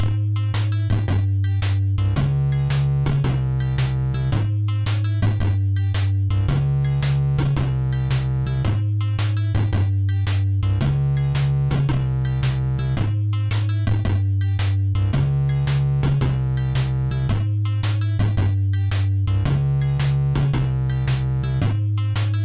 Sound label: Twanky Jungle